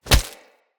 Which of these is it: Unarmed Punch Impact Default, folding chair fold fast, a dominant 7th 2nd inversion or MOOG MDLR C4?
Unarmed Punch Impact Default